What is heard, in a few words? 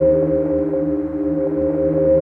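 Pad Synth; Equipped Music; Loungin House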